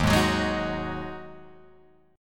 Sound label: Eb Minor Double Flat 5th Eb Guitar Open G